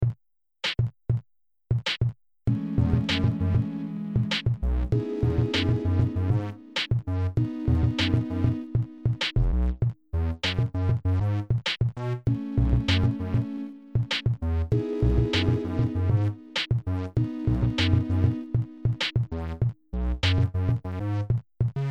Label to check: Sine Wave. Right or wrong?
Right